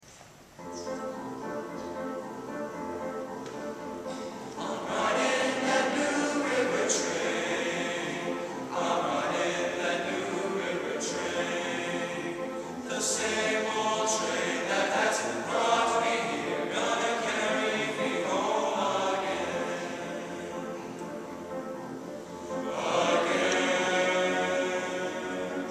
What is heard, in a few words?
boys choir; new river train